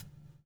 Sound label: BUBL, BUBBLE; Spectrasonics - Backbeat